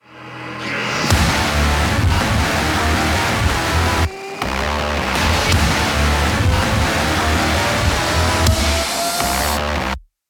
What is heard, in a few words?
Flesh & Metal heavy; Doom